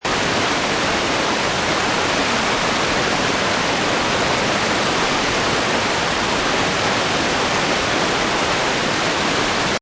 Water Rapids Flow River Loop, Evenly flowing water rapids, river or heavy stream white noise; Evenly flowing water rapids, river or heavy stream white noise. Can also be a waterfall. Seamless loop.